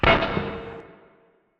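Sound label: Assembly Plate Lift Impact Rock Distant; Boomtown; Titanfall 2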